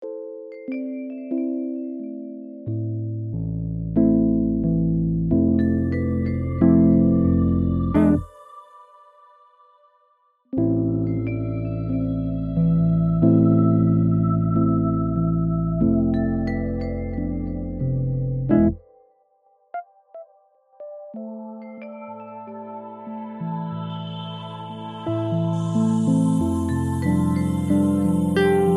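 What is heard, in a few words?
Ghosty, 91 bpm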